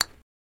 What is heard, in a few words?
Golf Ball Hit Putter